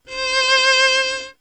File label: violin; violin4